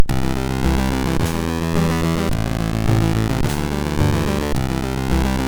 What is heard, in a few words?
Low Speed, sid MOS6581R2